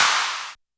Burst Noize; YAMAHA RM1X

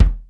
BIG KICK, ACOUST KICKS; Greytsounds

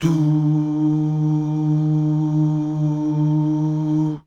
DUUH

